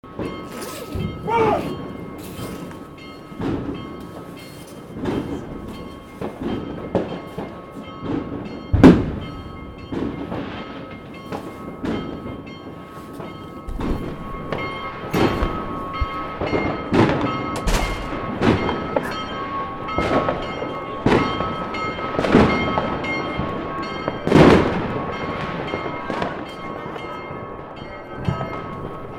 Geldrop new year, field recording; Gijs Gieskes

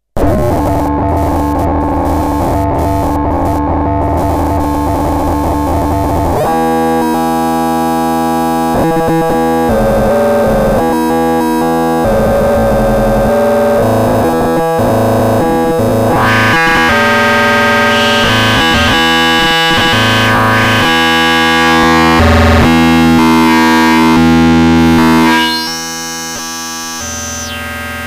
acid machine noise; Gijs Gieskes

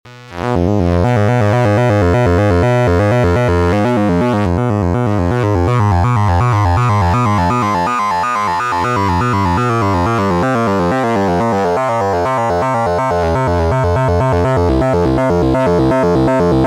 Midi all arp up down, wavetable radar

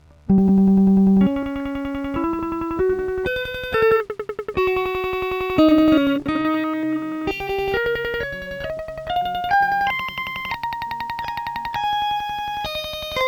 sampleloop guitar

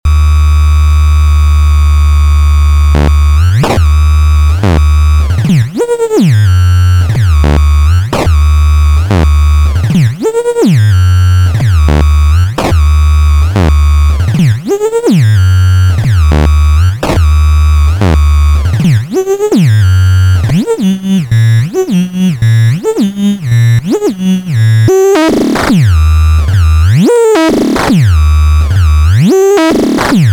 HSS2020

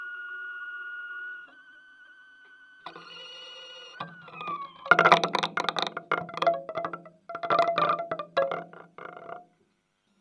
maglevdrummer and bouncy

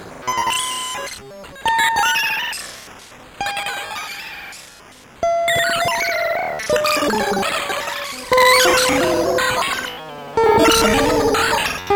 guitar arpegio CV inputs

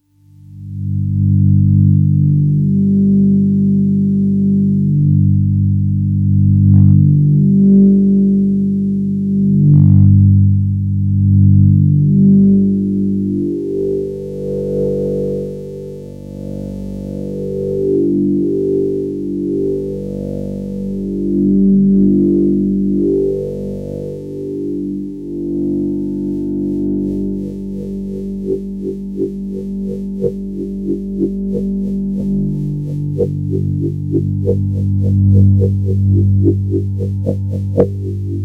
Totem Tone Wheel; Gijs Gieskes

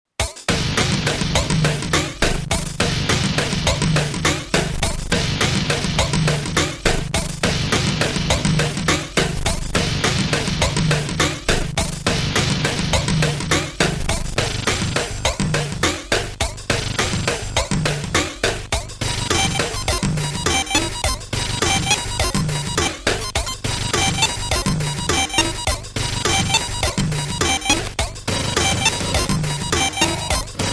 ddd1 707, circuitbending; Gijs Gieskes

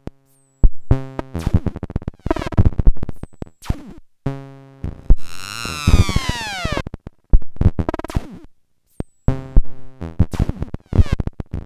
no drums, eurorack

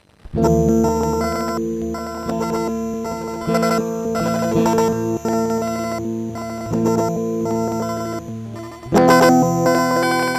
guitar arpegio upDown

